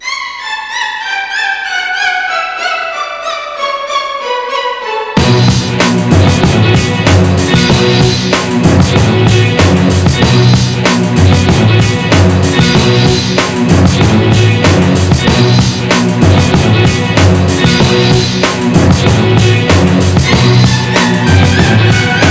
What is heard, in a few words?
Orchestral beat